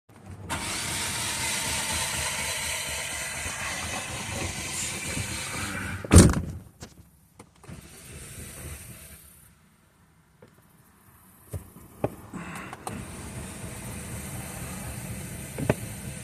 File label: car won't start; g37 sedan